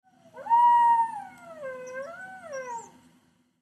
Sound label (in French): loup